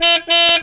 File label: Electro FX; Ueb HE Electro FX